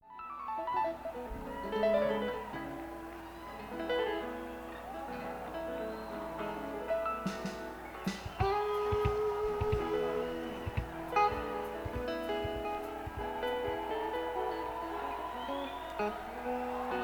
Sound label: Tuning